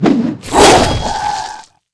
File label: were Boar skill